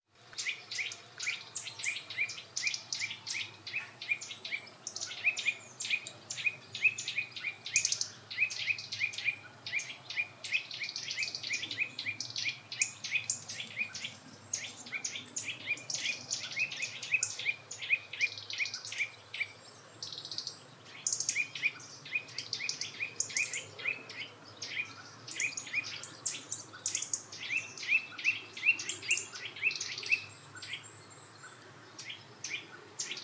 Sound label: Sitta europaea; SETE